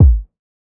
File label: kick depth; Drum Sample Pack; One Shots; Slate; SEISMIC